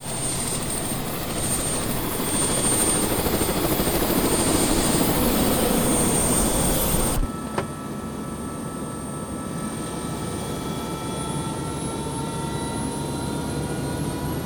helicopter ride

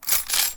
gun reload

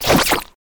knife slash

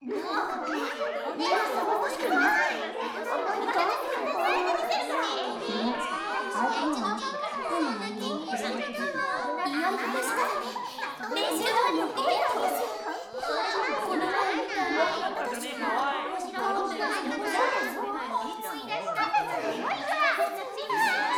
people gaya classroom

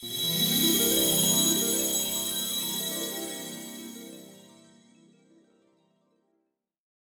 shine

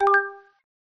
decision, button

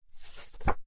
page

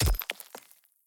pick axe

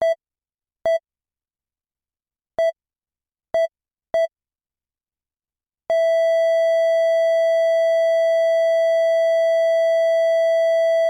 electro cardiogram

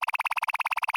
message display, button

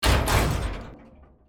keiba start

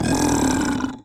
goblin cry, battle

